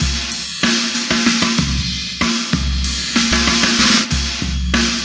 095 super compressed jazz kit, drum loops and breaks; 091 to 100 bpm